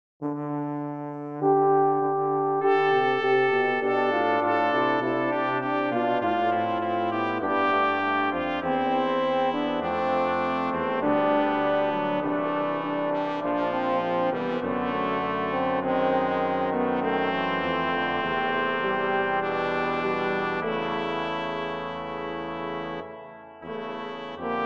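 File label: Canzona brass quartet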